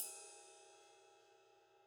Ride, cymbal; SAA Rock 20; apulSoft apTrigga2; Multi-Velocity Cymbal Samples

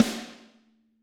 Snare Hard; Drums; apulSoft apTrigga2; Steven Slate Drums

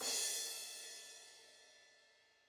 Crash, cymbal; apulSoft apTrigga2; Multi-Velocity Cymbal Samples

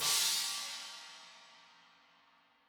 China, cymbal; SAA XCC 18; apulSoft apTrigga2; Multi-Velocity Cymbal Samples

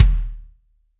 Kick Hard, Drums; apulSoft apTrigga2; Steven Slate Drums

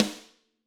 Snare Crack

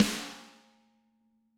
Snare Hard

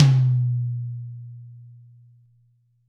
Birch Tom Hard; Drums; apulSoft apTrigga2; Steven Slate Drums

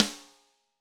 Snare Crack

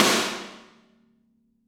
Snare Crack; Drums; apulSoft apTrigga2; Steven Slate Drums

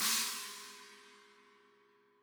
China, cymbal; ZKCC 17; apulSoft apTrigga2; Multi-Velocity Cymbal Samples

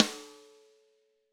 Snare Crack

